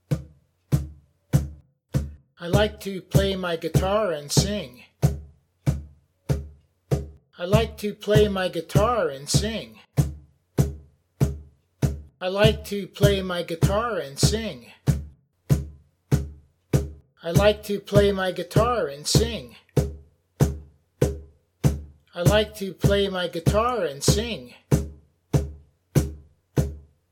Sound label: Guitar Sing